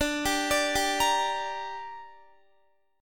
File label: D Suspended 4th Sharp 5th D down Guitar, chord